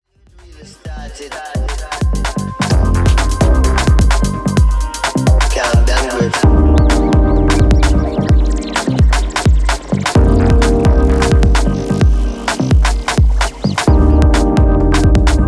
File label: SCRATCH